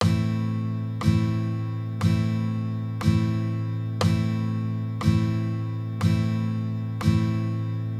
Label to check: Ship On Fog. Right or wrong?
Wrong